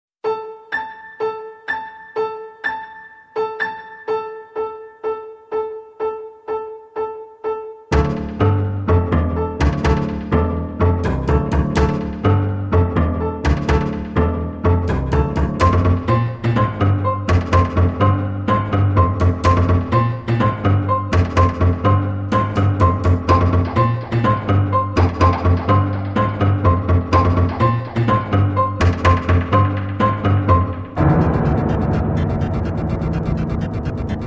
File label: Backbeat